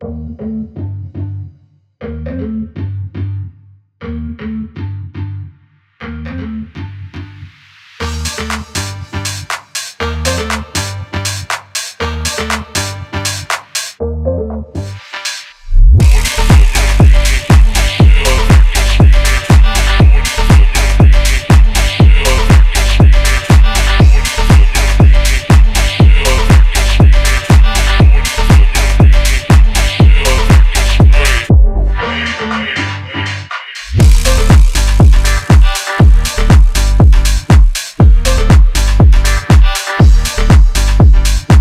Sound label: Deep Phonk